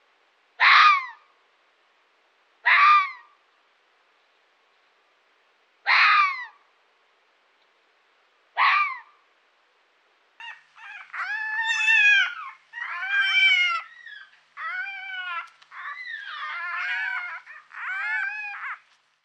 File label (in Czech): hlas zvuky zvirat